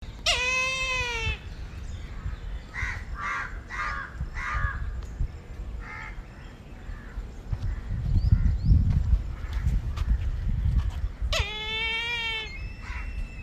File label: Haliastur indus, roofvogels; Accipitrinae; Brahmaanse wouw; Accipitres; Accipitidae